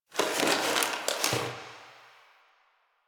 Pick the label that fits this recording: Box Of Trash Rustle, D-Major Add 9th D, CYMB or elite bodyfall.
Box Of Trash Rustle